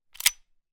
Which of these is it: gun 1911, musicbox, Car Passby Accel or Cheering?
gun 1911